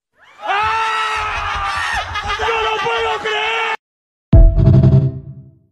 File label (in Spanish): jajaja